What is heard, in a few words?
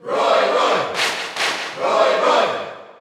Cheer Dutch; Roy; SSBU